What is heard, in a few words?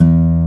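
E 1 OVATION, acoustic guitar; AKAI S6000 CD-ROM - Volume 1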